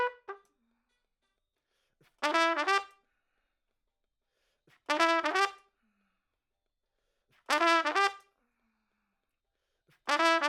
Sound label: Trump